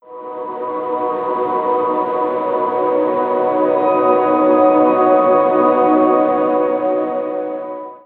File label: glassy synth pad spacey wide B major